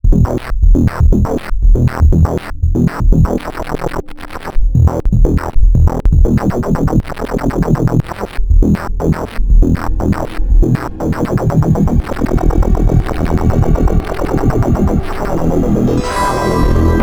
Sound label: sines